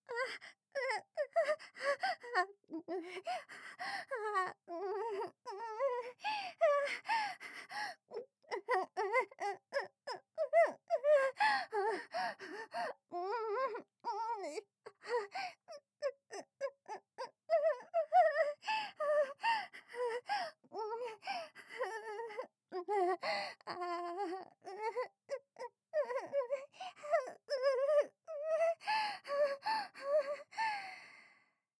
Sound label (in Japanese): 喘ぎ大きめ; 喘ぎ、耳舐めのみ